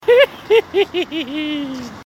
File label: hihi